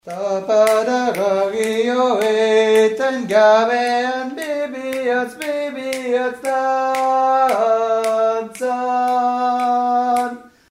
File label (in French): hommes; bi bi; mes 62-66